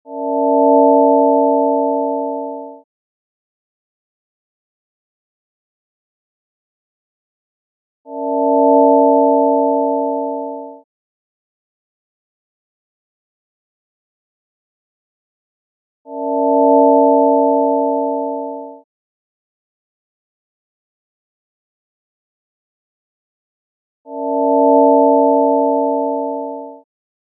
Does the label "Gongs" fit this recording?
Yes